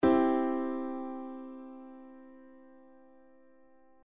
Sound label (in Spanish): C estado fundamental